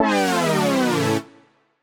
Poly Chord Hits, Ramp Down, A; JPEGG; future rave

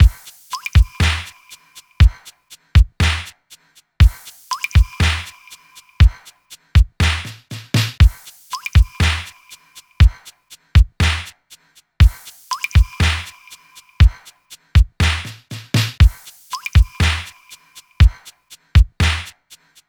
drums A, Smooth RnB, Abmaj

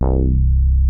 FAT BASS, BASS STATION; Syntec; Wall of Sounds

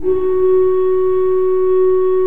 F3, Cathedral Organ; ILIO; Propeller Islands

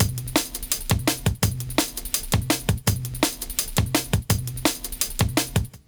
LOOP, Explosive Jungle

